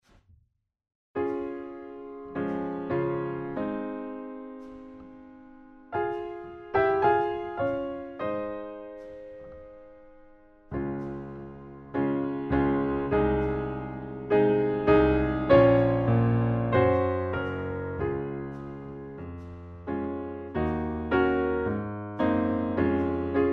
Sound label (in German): Playback Klavier; Playbacks; Erwartung; Koessler